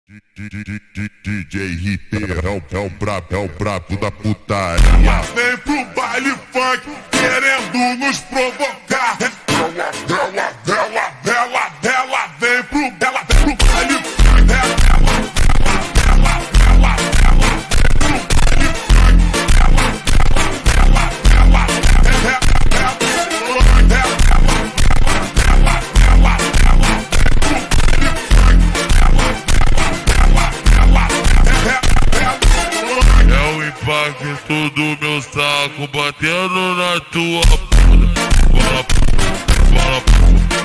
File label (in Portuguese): montagem vozes super slowed reverb; TikTok